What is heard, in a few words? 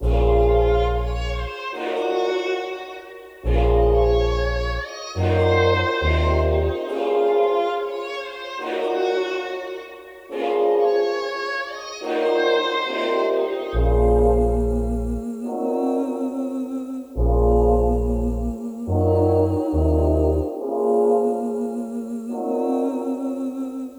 140 BPM A# Min, melody; Plug